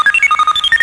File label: Satellite; Working Model Program